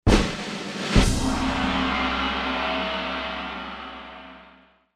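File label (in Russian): дробь для игры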